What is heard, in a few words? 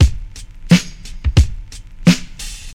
88 bpm drum loop sample d key, Free breakbeat - kick tuned to the D note. Loudest frequency: 866Hz